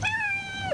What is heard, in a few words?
cat; Children's Atlas of the United States